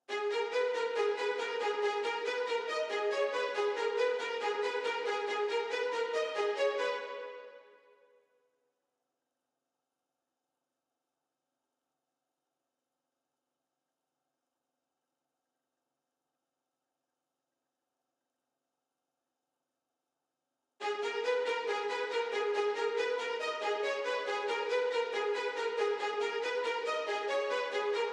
139 BPM G# Min Strings, Drill; Melody; Loop Stems; Cymatics; 2022 Melody Collection - Drill; No Involvement

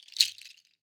Goat Toenail Shaker; World Percussion; Cymatics; Emperor World Percussion

